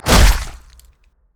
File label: basic attack knee; rengar